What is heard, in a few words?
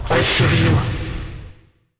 curved notice